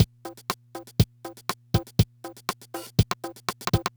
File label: Loop, dd-5